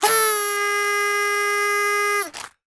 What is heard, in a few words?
horn